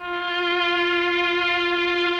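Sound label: MELLOSTR, Mellotron Violins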